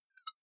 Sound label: hover high tonal